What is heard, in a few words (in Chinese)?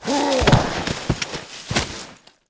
壮男 死亡 倒地